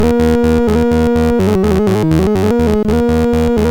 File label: Snare, MOS6581R3